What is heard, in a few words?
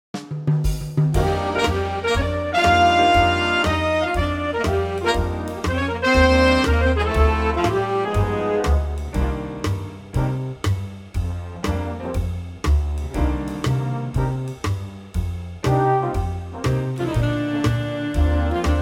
big band Bb